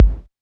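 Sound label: technox bass drum; Quasimidi Technox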